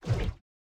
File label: shoot; Mindustry; Atrax